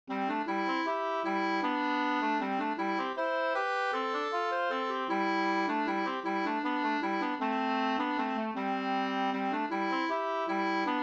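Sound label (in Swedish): vals, two-part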